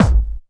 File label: techno 2, bassdrum; Ueberschall; Techno Trance Essentials; DRUMS; BD TECHNO2